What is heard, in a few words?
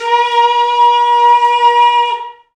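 Wind Bullhorn, Afro Horns; Roland LCDP11 Africa VOL-1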